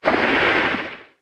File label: stomper idle, enemies; Factorio